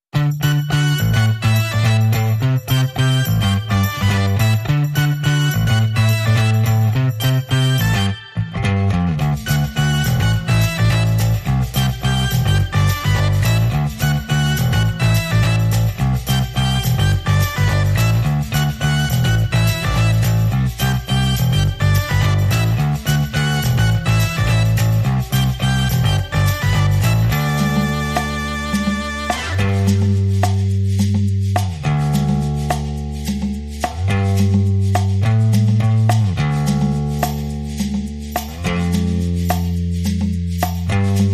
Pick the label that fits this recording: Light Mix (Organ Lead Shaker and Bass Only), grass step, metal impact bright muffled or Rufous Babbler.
Light Mix (Organ Lead Shaker and Bass Only)